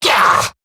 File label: Pain Eximus Grineer Male; Battle Barks